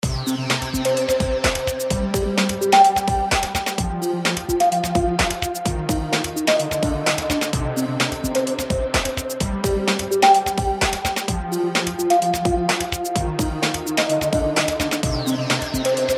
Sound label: menu loop